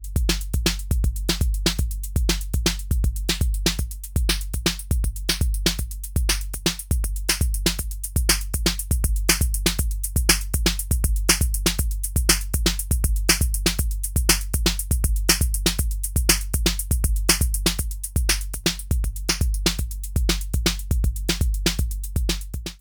Bastl Thyme Extra Heads Levels Anhebung Beat

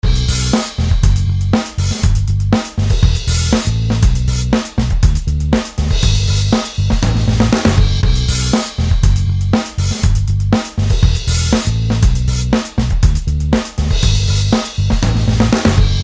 Rock Style mit Bass

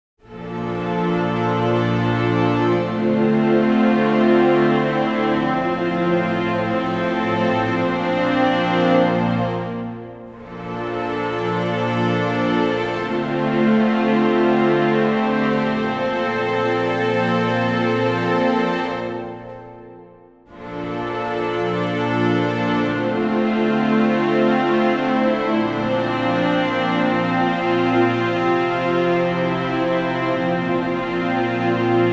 Streichersatz ohne C S

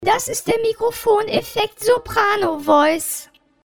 Yamaha ZG01 Mikrofoneffekt Soprano Voice

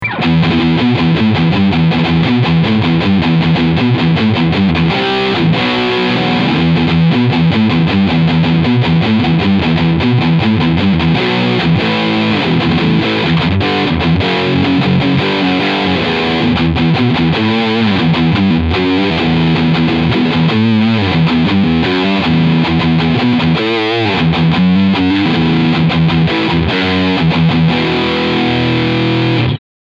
Steg Riff High Gain

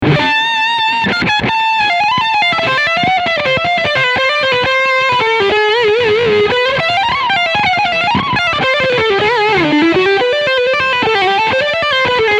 Leadsound Steg HB

